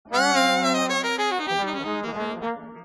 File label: horns